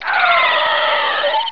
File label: moan; poke646